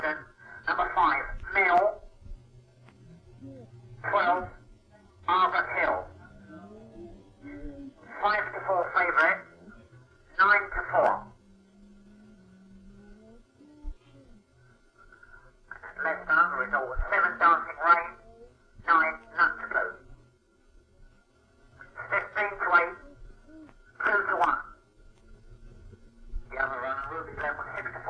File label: Racing